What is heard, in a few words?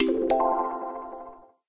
Sfx - Score Total